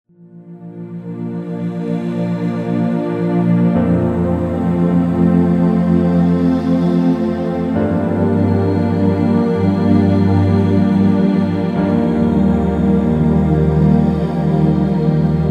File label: Lucid Dreaming Binaural Meditation, Binaural Beats, Isochronic Tones; Lucid Dreaming Binaural Beats Meditation with Isochronic Tones